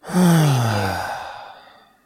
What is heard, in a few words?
Sighing, Bored Or Relief, Male Relieved Sigh